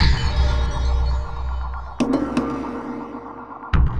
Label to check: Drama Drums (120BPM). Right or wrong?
Right